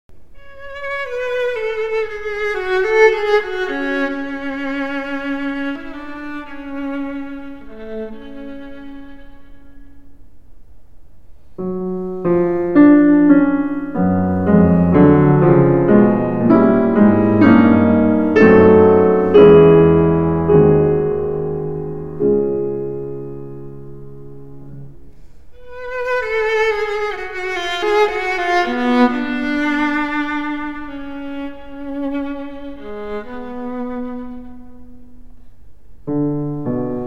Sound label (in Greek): Βιόλα Reverie για βιόλα και πιάνο